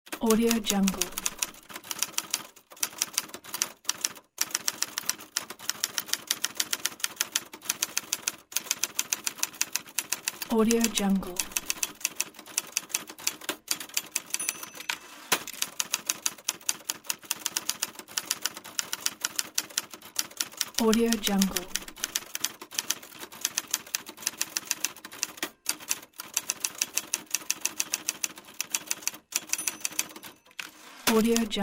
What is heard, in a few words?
typewriter